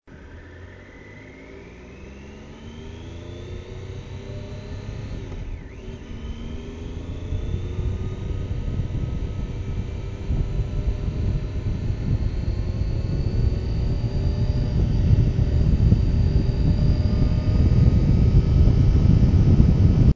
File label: triumph daytona 955